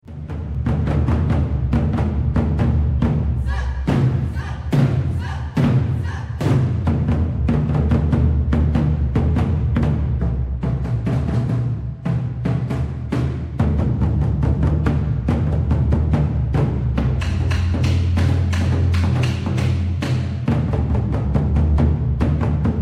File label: taiko